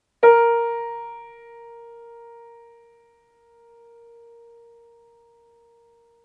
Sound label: piano A sharp